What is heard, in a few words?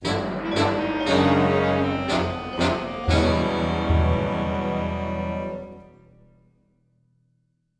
Death Stinger; Sly Cooper Thieves in Time PS Vita PS3 2013